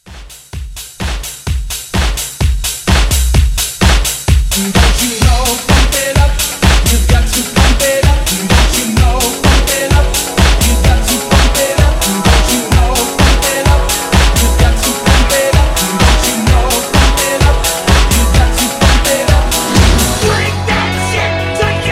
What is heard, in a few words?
80s Rock Hype Re Drum 128; Quickhitter